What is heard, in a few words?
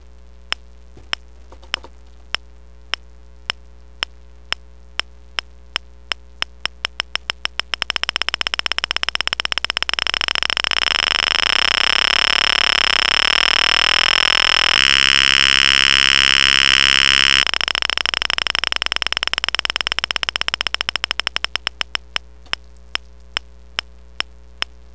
metronome